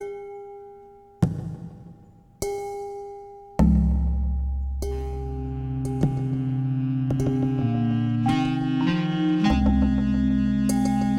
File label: Sax; Voodong; Thirty Suva Sound Sketches